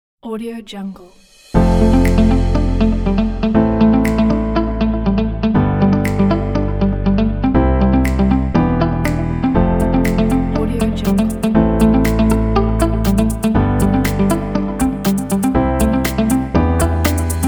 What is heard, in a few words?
uplifting upbeat inspiring corporate digital presentation